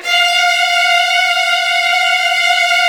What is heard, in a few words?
F2, Strings, STR HLF TRLL; ILIO; Kirk Hunter Virtuoso Strings VOL-3; 90 s Sample CDs